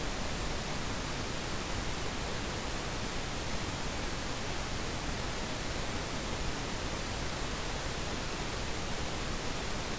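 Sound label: 30.6 dBA Pink noise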